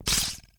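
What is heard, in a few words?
ninja sword end, impact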